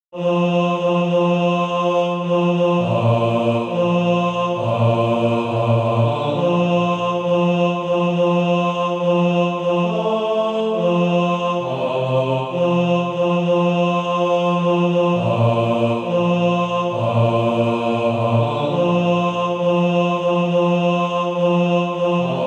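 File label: bass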